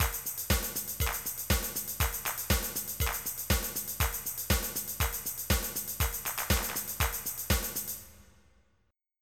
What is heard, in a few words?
Disco